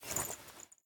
mvt armor; smite; gilgamesh

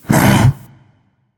notice; smite; voicepack; guanyu

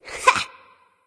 nv heh heh; smite; voicepack; ratatoskr

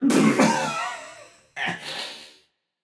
clear throat, voicepack; smite; jorm

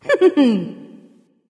"heh heh"; smite; voicepack; nike

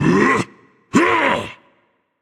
battle cry; smite; ahmuzencab

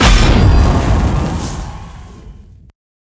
impact; smite; chaac

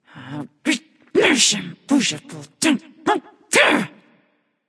grumble; smite; nox

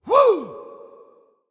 grunt; smite; he bo

